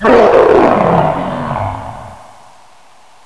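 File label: death zombie; Reega kz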